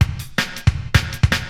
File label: DRUMLOOP D+B 160, Drum+Bass Loop; eJay